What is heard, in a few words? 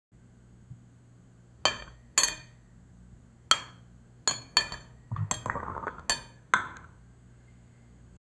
cinematic sound of dishes, tap